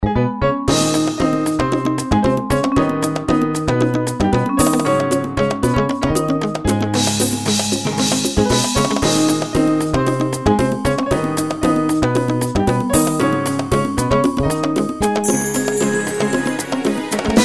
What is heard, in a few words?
Acoustic Dreams